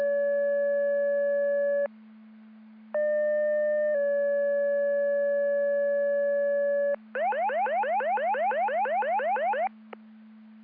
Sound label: Siren; Andover Twp Boro